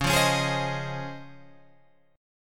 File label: C sharp Major 9th, Guitar, Open G